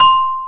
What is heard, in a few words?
METAL HI; Back In Time Records - Big Bang